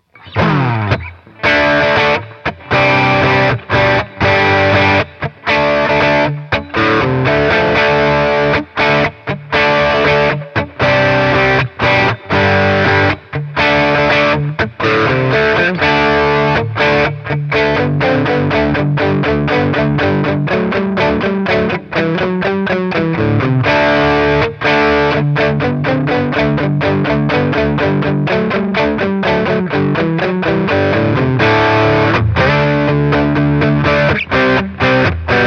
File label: ES335 Boss Katana 100